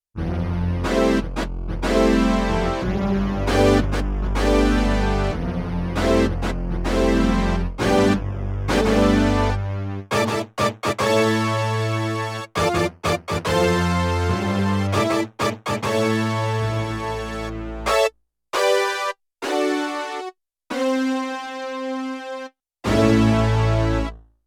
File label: Synth Brass, Yamaha TX16W; Spacey Keys 2